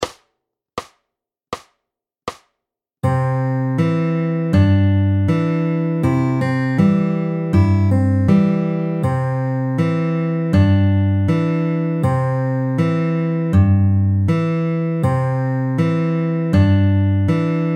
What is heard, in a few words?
Slow, fingerpicking